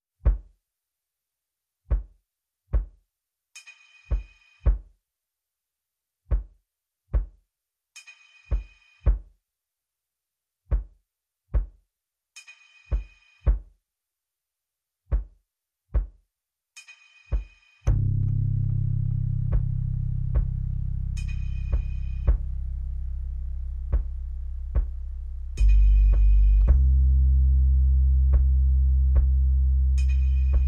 Drums and Bass Mix

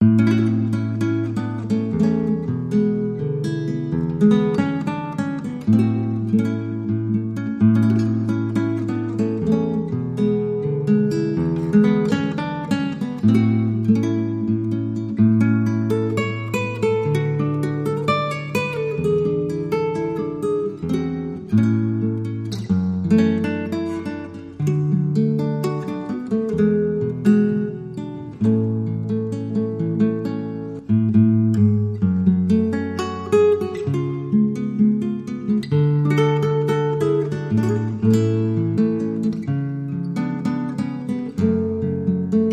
Mexican Dance Rich Guitar Theme

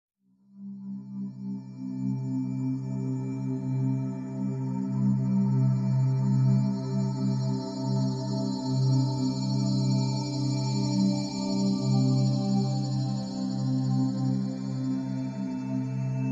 Snared Rapid

